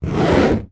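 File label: kayak plastic rub against object; transport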